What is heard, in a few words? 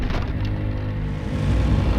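Music Volcano 120; from dust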